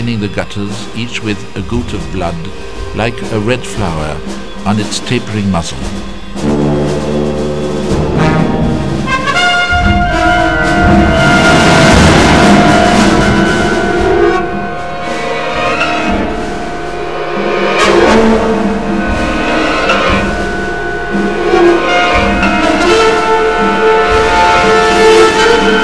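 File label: natural trumpet; Robert Gerhard: The Plague (3. Choc sur la population)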